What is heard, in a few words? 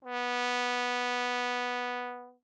Tenor Trombone ff B3